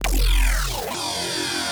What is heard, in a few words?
Sus, bass